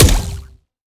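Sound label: Sci weapon Shot Small Blaster Anti Matter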